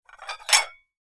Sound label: stacking plates